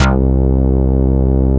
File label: SQ80 HS BASS, synthesizers; Soli-Music; World of Synthesizers VOL-1